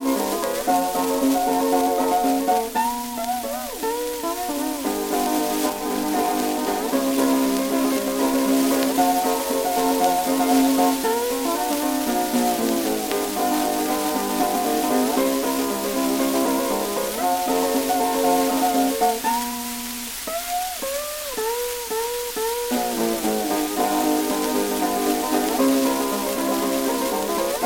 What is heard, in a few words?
Laughing rag, jukebox folk songs